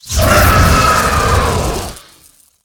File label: Charged Devilsaur Death